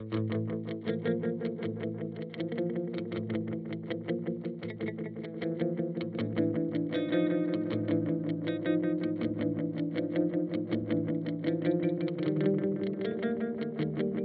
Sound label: JHS Panther Cub V2